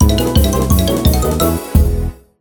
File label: obtain key item; Manamon Music; Manamon 2